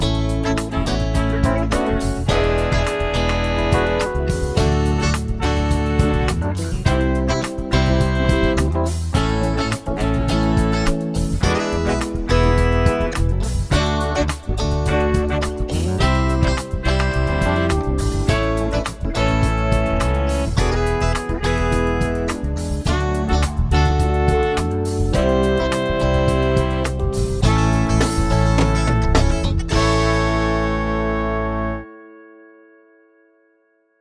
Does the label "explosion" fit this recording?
No